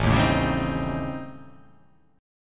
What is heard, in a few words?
A 7th Sharp 9th Flat 5th